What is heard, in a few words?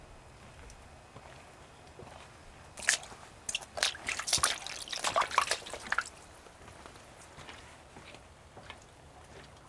Puddle